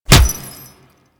Punch Impact; Warframe; Grineer Royal Jester

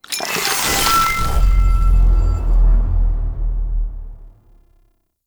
Confirm Socket Shard; DE; Warframe; Helminth Shards

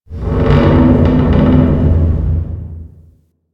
Metal Flex, Ambience; Warframe; Corpus Railjack

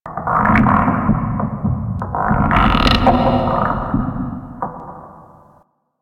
Tentacle Creak C; Ambience; Interior; Warframe; Orokin

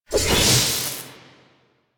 Archwing Scythe charge whoosh; Melee; Warframe; Exalted Archwing Scythe

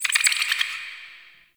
Tiny Creature; Ambience; Dungeons; Under City

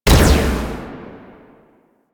Spear Gun Shot; Weapons; Warframe; Tenno; Hoplite

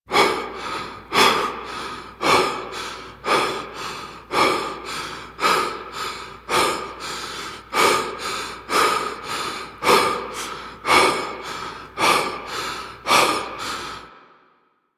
Breath Running Loop; Kahl Mission; Warframe; Veilbreaker; Kahl Barks

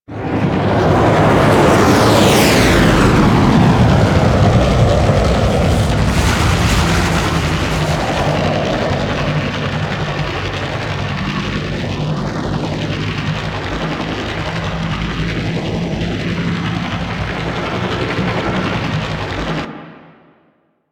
Dropship Flyover; Warframe; The New War; Narmer Outpost

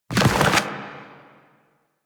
Ground Spike Dissolve; Warframe; Zariman; Void Angel; Abilities

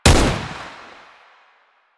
AK47 Fire; Warframe; Tenno; Weapons

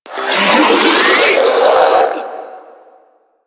Spin Scream; Enemies; Abilities; Warframe; Proto Infested Ancient

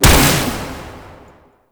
Tendril Grasp Weapon Fire A; Warframe; Broken Frame

